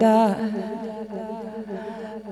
Vocal Echoes

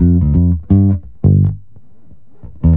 Bass G 087

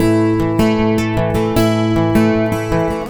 Picking A 80

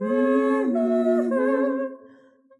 Soft Soulfull Harmony

